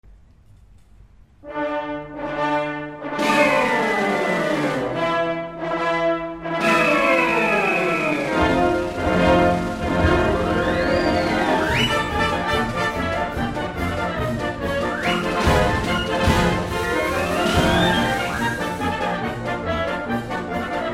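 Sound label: Circus March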